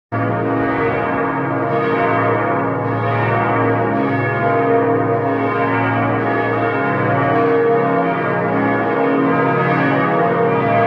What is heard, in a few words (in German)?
glockengelaeut